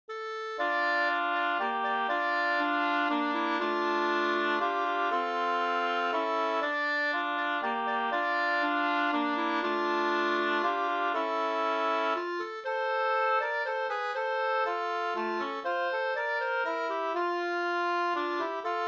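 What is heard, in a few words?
polska, two-part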